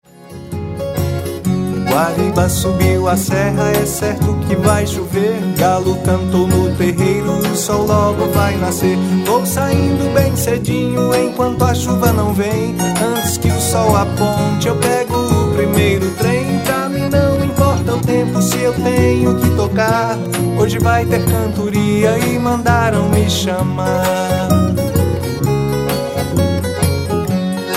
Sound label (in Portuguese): viola Xote